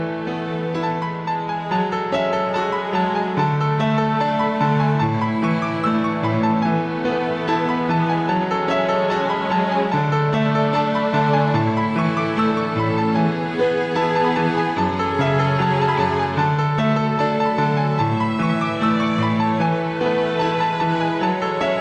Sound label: Lullaby; Bellas